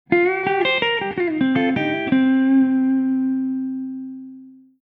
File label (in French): Country, Guitare Country; methode guitare country